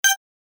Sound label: cursor